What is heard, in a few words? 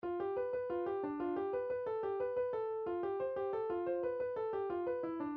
polka, midi; truck polka